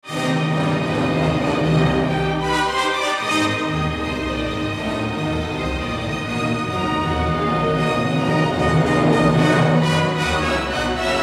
Symphony No. 4 in E-flat Major I. Bewegt nicht zu schnell; Bruckner; Romantic